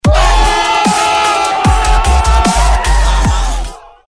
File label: ooohhh; hl2dm; oppressive territory